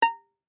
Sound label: Violin pizz ff sul E C6; MIS Pitches - 2014; Strings